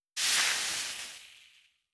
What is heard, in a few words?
Nature